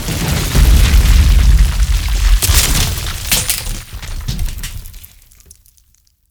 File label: Explosions Building-Collapse